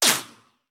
Bullet Ricochet; Sound Ideas; Guns

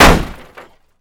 hood impact; Blind Drive

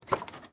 Viper GTS Door Open; Automobiles

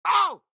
cyclers hit reaction; Blind Drive

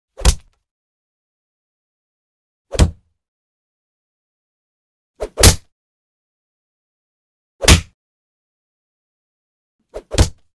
Punch 5X; Hollywood Edge; Martial Arts and Human Impacts

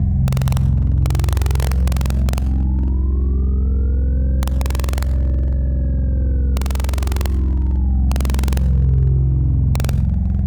Static; Blastwave

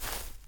long grass; firefight

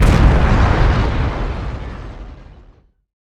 Explo Medium Muffled; Destruction

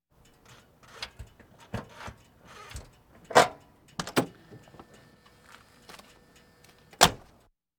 Automobiles various

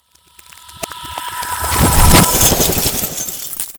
Ice Spell Transformation Invisibility; Arctic Aura

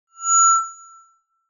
Microphone Feedback - Short Classic; Hollywood Edge; sound designer's toolkit v2

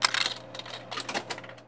Coin Insert Vending Machine; SFX Kit; Foley - Misc

